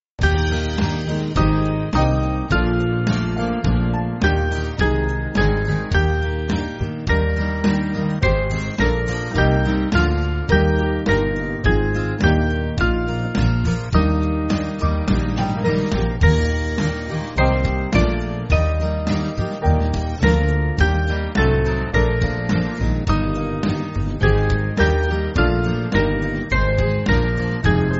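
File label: Band, Music Backing Files for Churches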